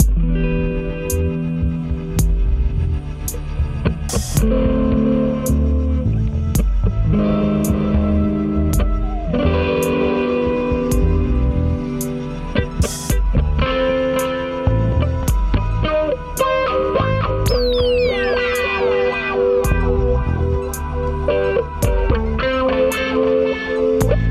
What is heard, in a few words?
Synthetic Waves